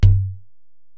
Ac Perc, Drum Machines Alesis DM-PRO

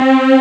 soft string; Amiga Soundtracker Sample Packs

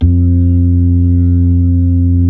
B-3 Club Xfade, Keyboards of The 60's and 70's

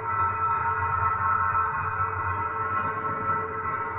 Tonal Re Draw, sparse soundscape

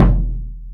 Surdo

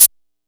kit, Yamaha Motif-ES8

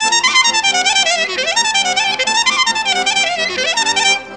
CLARI GROOVE; Best Service; World Colours

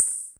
RSS PERC

